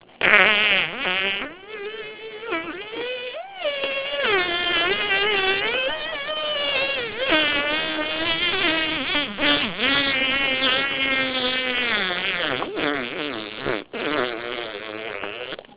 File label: fly